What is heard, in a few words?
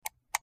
Highpitched Mouse, mouse